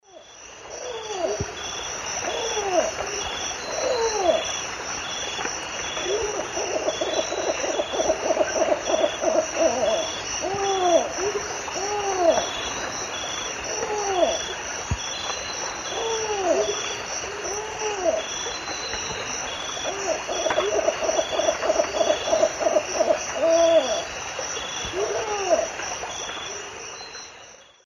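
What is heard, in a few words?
Zebrilus undulatus, song and calls playback; S. America; Eastern Lowlands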